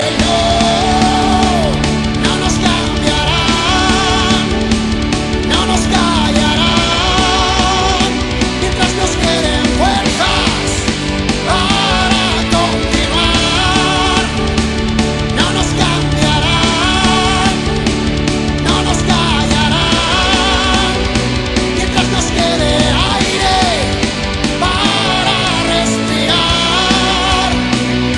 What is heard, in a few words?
Warcry